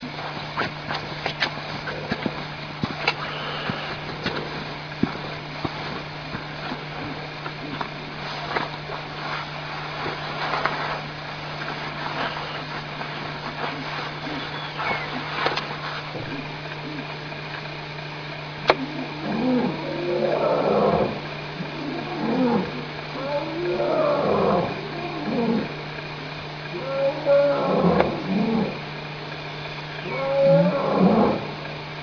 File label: Lion Roars; africa2001